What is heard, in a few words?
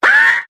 "ah", Voice ooh ahh